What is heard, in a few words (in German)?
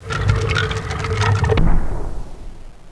tore; Anno1602